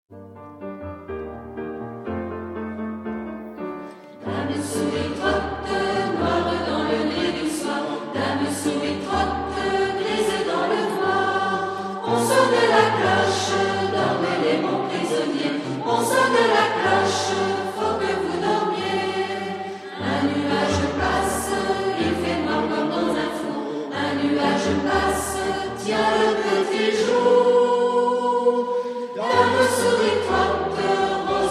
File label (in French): dame souris chant